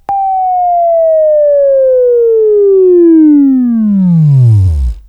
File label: WAV Right, Sweeping; Sweeping Training program